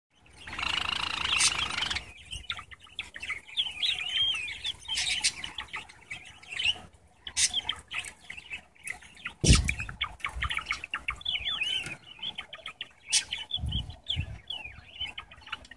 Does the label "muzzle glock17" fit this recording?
No